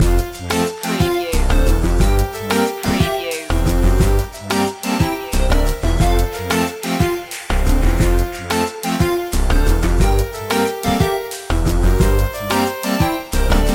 retro video game ferris wheel

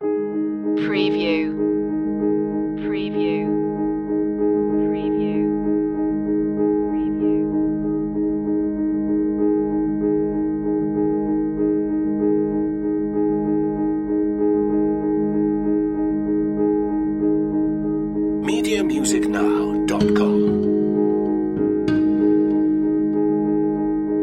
violin

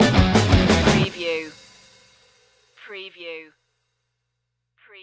punk pop stinger

